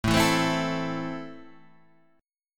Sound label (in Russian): Akkord Am 5 lad boj